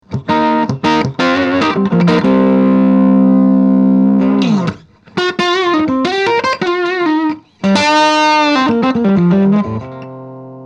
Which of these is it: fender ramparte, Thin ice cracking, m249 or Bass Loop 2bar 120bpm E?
fender ramparte